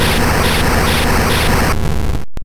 Fire Punch; Pokemon Essentials; PKMN Estelar 1.3

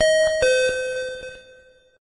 elevator; Pokemon Essentials; PKMN Estelar 1.3

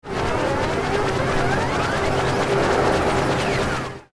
magic attack darkness; easyrpg; WitchsHeart

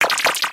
Cry; Pokemon Essentials; Pokemon Iberia V1.03

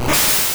False Swipe; Pokemon Essentials; PKMN Estelar 1.3

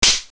slap; easyrpg; WitchsHeart

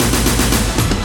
Menu Intro, Music; TMNT

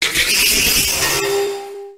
Cry; Pokemon Essentials; Pokemon Circon BETA 2 v1.8

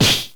kick low; easyrpg; WitchsHeart

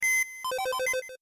computer open; Pokemon Essentials; Pokemon Circon BETA 2 v1.8

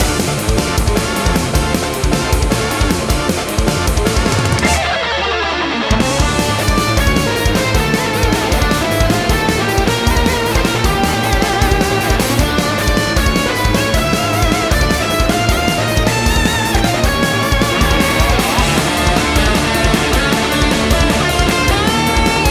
BOSS Super Shredder Loop; TMNT

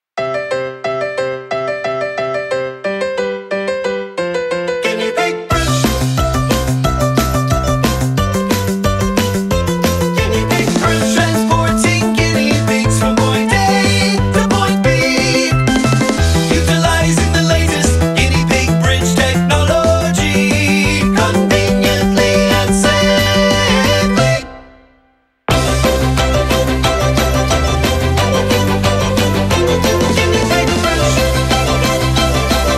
Guinea Pig Bridge

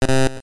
buzzer; Pokemon Essentials; Pokemon Circon BETA 2 v1.8

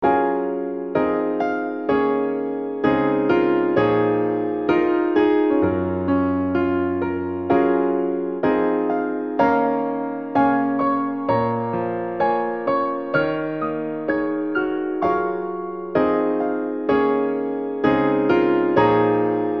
piano; easyrpg; WitchsHeart

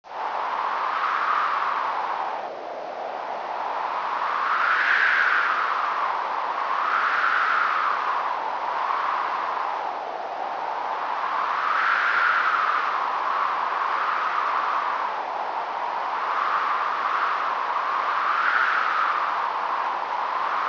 wind; easyrpg; WitchsHeart

